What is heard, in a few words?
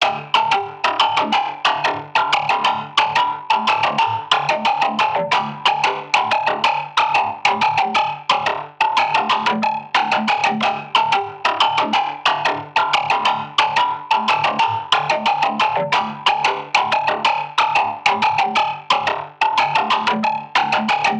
guitar scratch; M8; random and out of place